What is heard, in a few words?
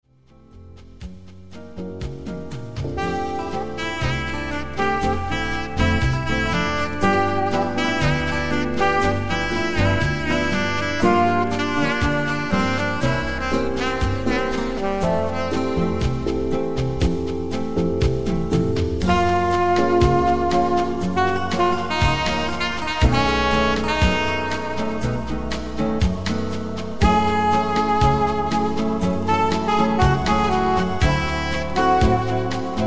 sax; latin